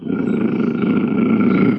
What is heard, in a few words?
die; RYL